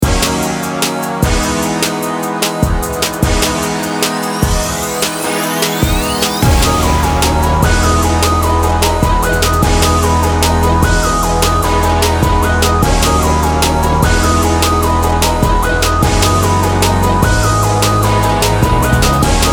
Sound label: hip hop; loops; logic pro